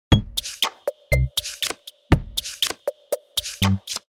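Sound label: game background music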